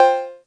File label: twotone 808 cowbell